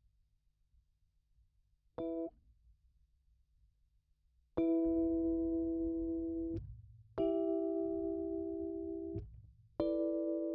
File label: Rhodes